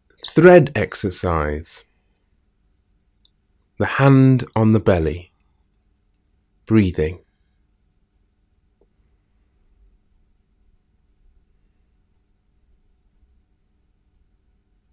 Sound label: breathing; thread exercise 2